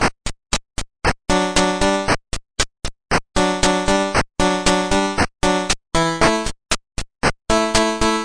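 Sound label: 8bit loop, 116 bpm; melodic loops; synth and electronic loops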